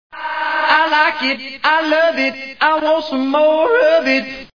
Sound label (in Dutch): geluidje